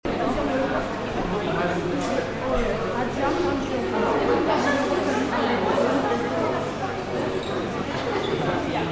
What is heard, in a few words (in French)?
AMBIANCE BRASSERIE ELEVES